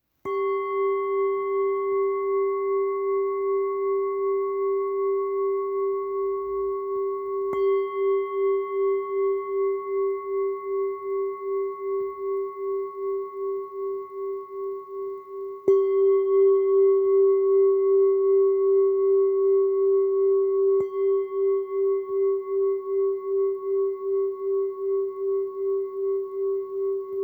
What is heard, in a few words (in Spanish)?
sol 381 392, cuencos tibetanos; Deluxe